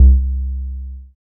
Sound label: BASS MINIMOO